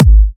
studio kick single hit g sharp key, Royality free bass drum tuned to the G# note